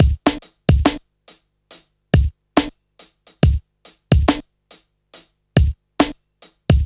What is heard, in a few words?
Slomo Beat 140 BPM, Loops, Slow Motion Beats